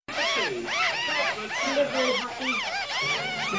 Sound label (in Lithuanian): kalba seskiukai 2 savaites